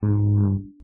bass; fofix

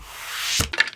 slide; gcompris; align4 2players

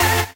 rave bass; basses; lmms

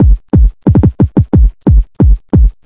rave kick; lmms

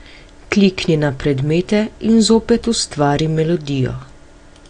melody; gcompris

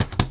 blocks; tuxpaint; magic

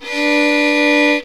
violin double stop; instruments; lmms

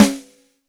sn Wet; drumkits; hydrogen; GMkit

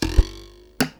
arrow; gcompris; target

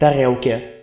cymbal crash; percussion; music; tuxpaint-stamps

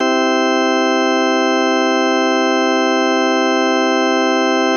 e organ; instruments; lmms

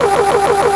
Factory noise; gcompris; memory sound

